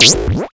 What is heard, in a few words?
Cwejman S1 Hat